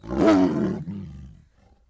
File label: ATTACK